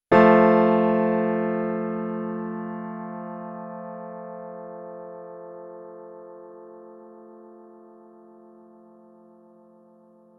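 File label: resonance test binaural, piano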